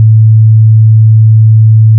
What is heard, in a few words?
110hz sine